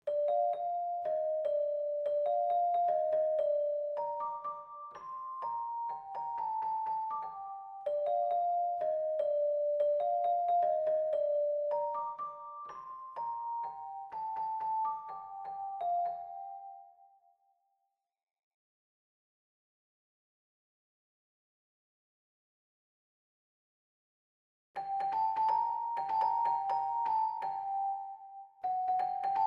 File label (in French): Piano